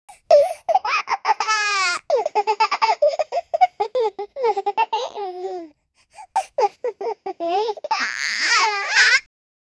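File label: cheerful cute baby girl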